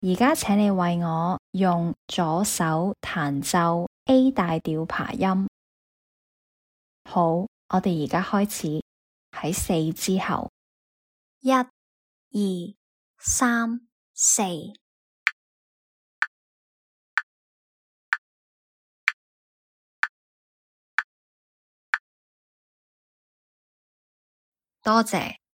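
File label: A major arpeggio left hand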